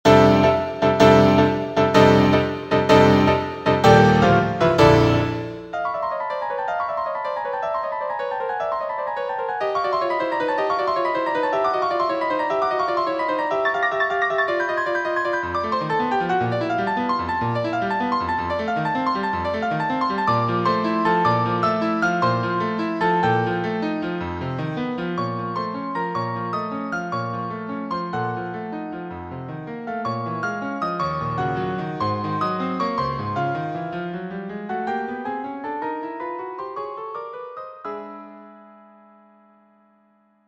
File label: piano